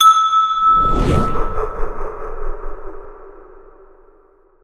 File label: chime